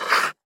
blader, iceskate

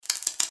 batt; vehicles

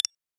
move select

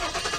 starting, vehicles

